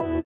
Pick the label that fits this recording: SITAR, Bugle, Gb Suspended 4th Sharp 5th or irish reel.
SITAR